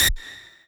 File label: lead